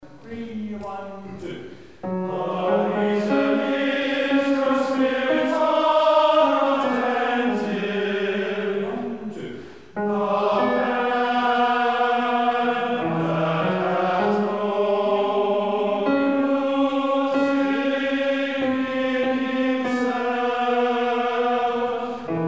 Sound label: Bass; Serenade